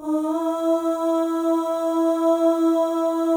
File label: OH, FEM, Symphony of Voices